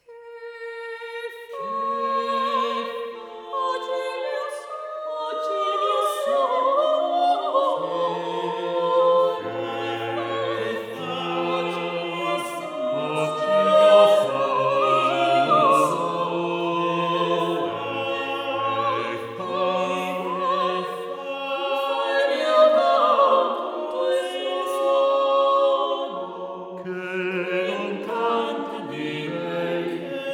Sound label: Madrigals for 5 voices; Book 1: Che fa oggi il mio sole; Luca Marenzio; La Compagnia del Madrigale; Primo Libro di Madrigali a cinque voci, 1580